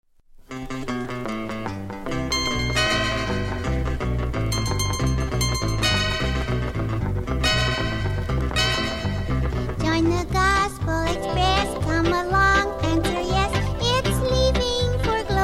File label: piep piep goes the whistle